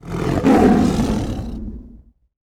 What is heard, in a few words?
growl whoosh; Stanley's Sticker Stories; Edmark